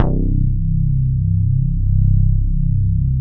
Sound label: TS 10 FAT, SYNBASS; Synthesizers; Soli-Music; World of Synthesizers VOL-1